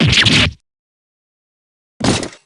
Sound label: fall; dgs2-drebber